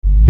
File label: Bass Dr, EuroDance Bdrums